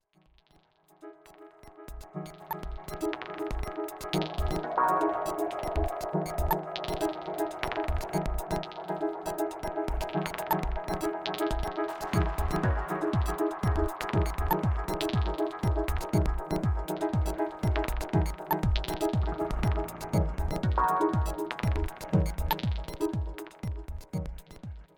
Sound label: dub